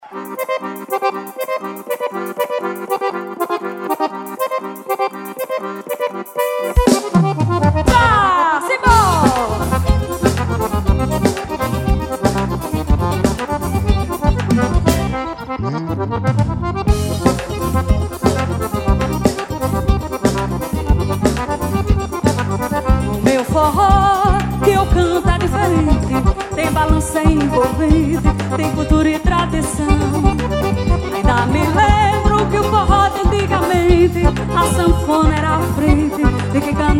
forro diferente

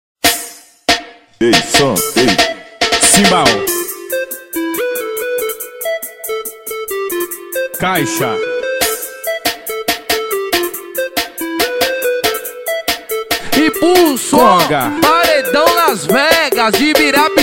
abertura

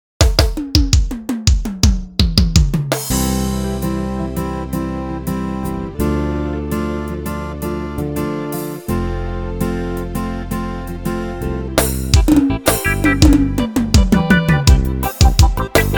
forro

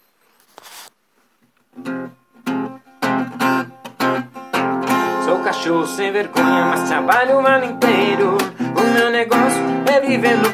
sou cachorro

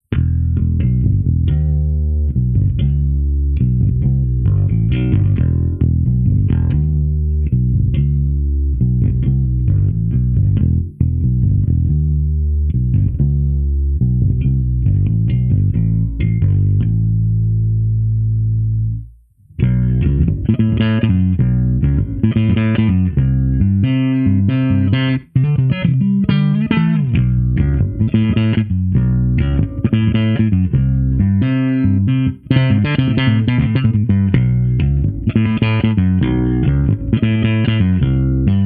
teste captador; crafter; stellfner stellbass

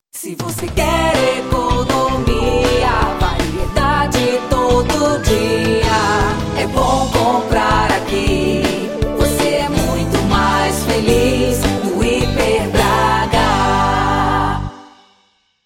jingle supermercado; hiper braga

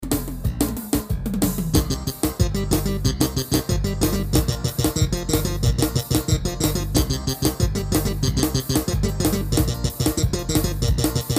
forró

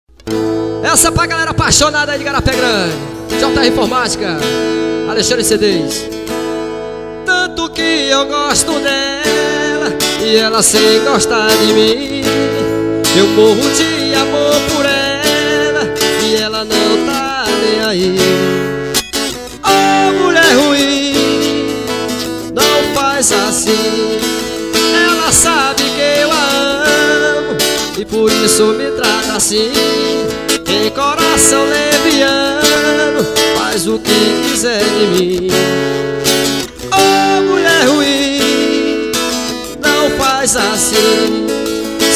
forro vaquejada

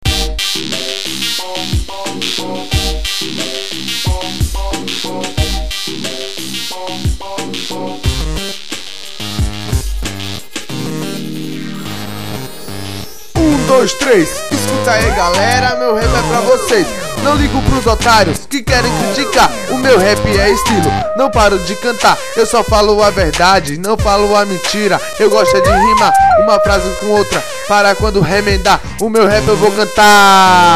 rap

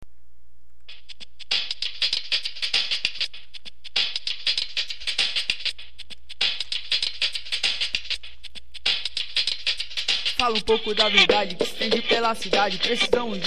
rapper pensamento real

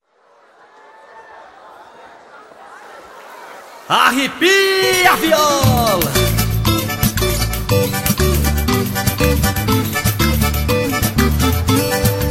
trem bão acústico

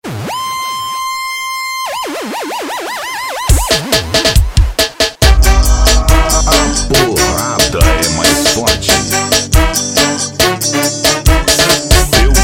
passagem de som

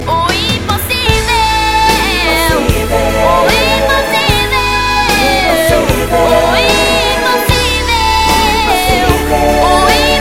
pout pourri cantora

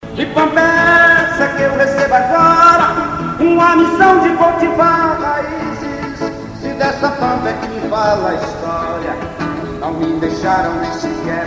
voz violao

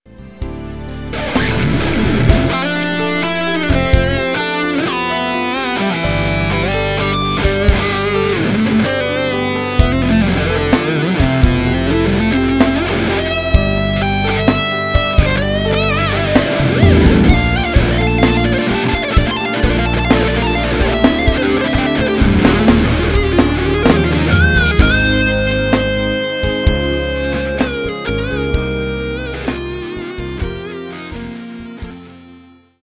solo; ls home studio

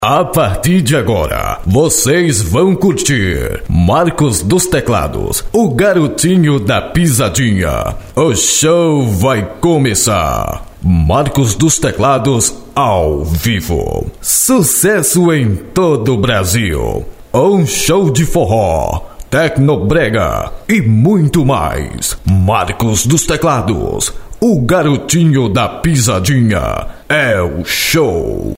abertura show teclados

